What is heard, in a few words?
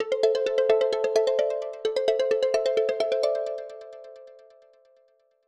video incoming call; Purism Librem-5